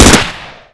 fire both, uzi

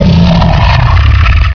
short monster; svencoop; hunger

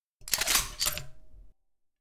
sniper reload

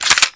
m16 mag out metallic

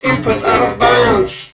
pain; hunger; franklin

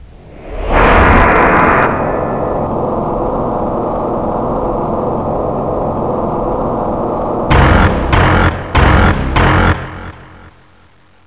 effect on; svencoop; mommamesa

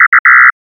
hex buzz; svencoop; fortified

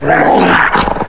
attack; hunger; hungerhound

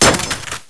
weapon drop; svencoop; items

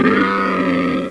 voltigore pain

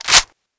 M1921 bolt back, tommygun; svencoop; hunger; weapons

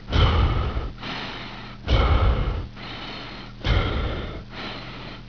bull idle; svencoop; hunger

